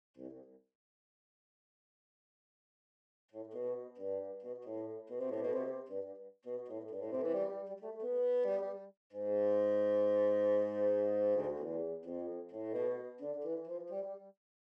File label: Bassoon